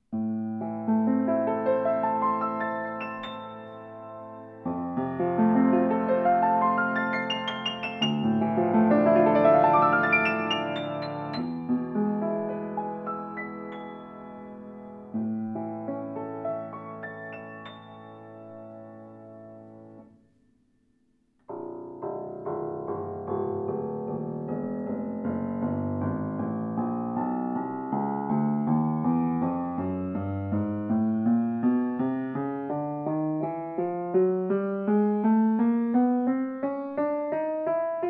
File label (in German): Grotrian Kreuz, Klavier